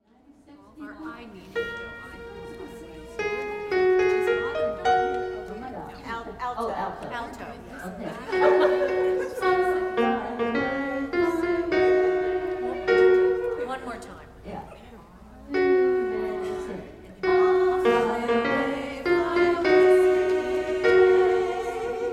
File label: altos